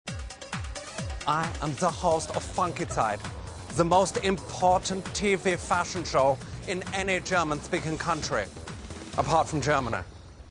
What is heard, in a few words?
funky